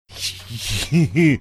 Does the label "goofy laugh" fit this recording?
Yes